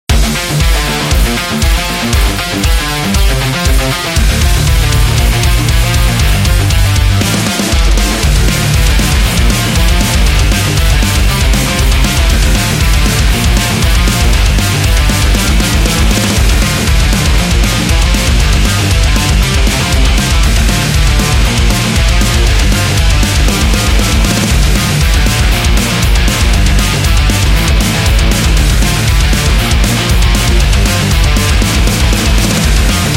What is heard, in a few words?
Punk Metal